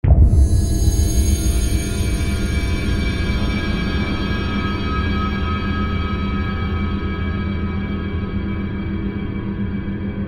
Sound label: Hit Shimmer To Ascend Robotic; Blastwave